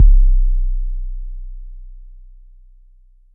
808 Bass Drop D

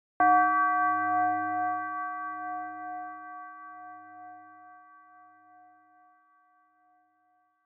Bell

